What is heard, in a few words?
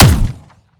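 weapon player; romeo870; gmod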